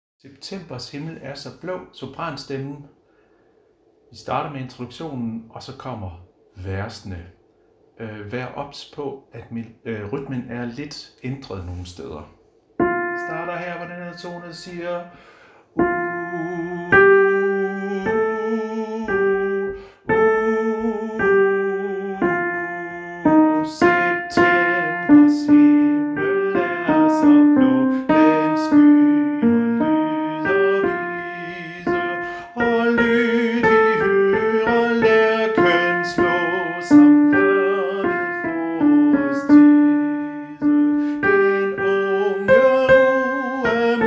sopran; harlev koret

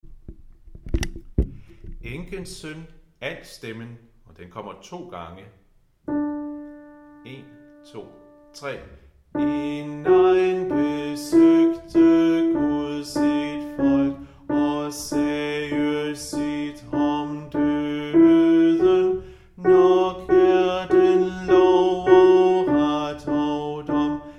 altstemmen; Enkens søn; harlev koret

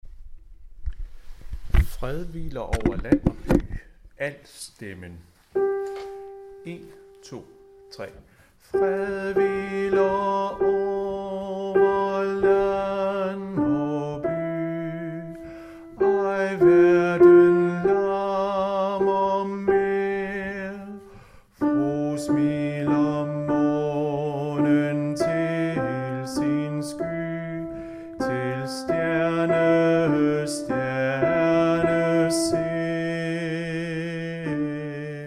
altstemmen; Fred hviler over land og by

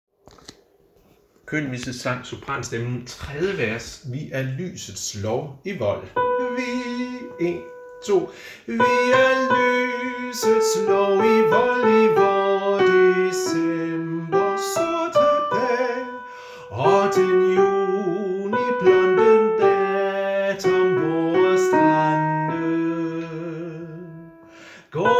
sopran; harlev koret

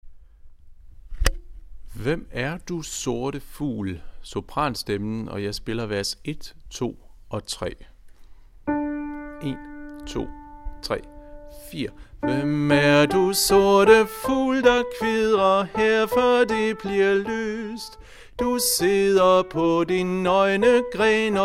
sopran, koret; Hvem er du sorte fugl; harlev koret

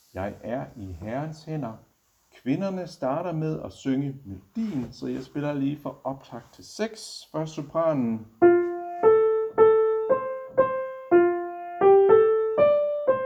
tenor; Jeg er i Herrens hænder

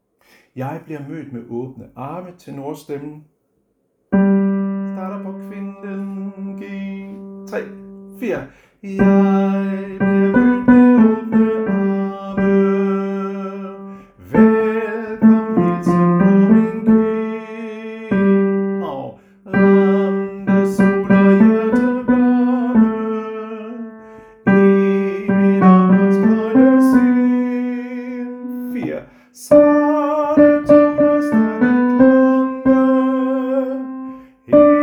tenor; harlev koret

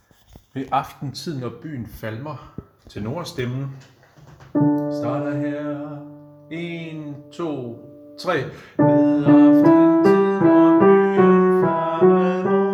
tenor, harlev koret

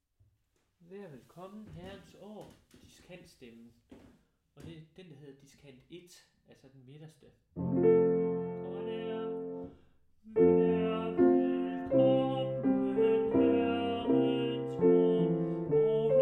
overstemme; Vær velkommen; harlev koret

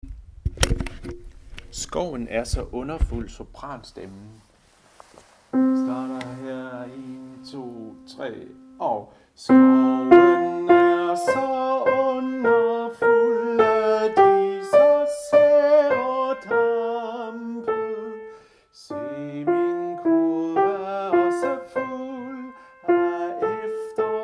sopran; Skoven er så underfuld

